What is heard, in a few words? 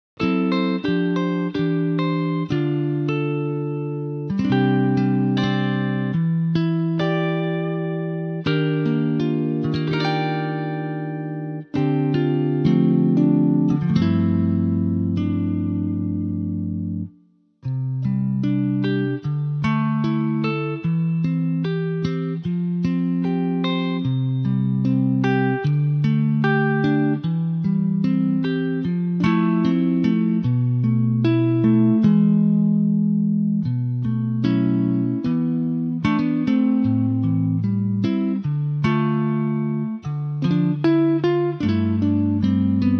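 C Sunburst guitar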